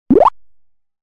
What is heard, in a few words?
button